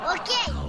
funny oki sound

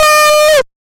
happy

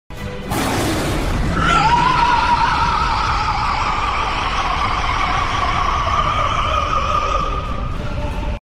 ahhhhhhhhhhhhhhhhhhhhhhhhh

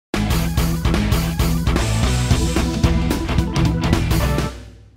success bumper; dribble

